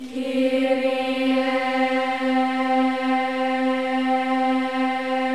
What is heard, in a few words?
KYRIE, Symphony of Voices; Spectrasonics; BC KYRIE